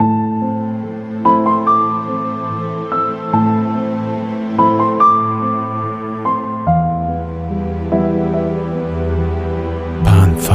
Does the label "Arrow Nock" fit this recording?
No